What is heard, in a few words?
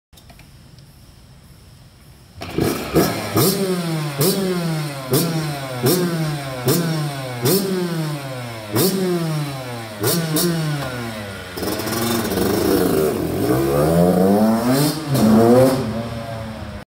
Yamaha Motocross Bike; کراس یاماها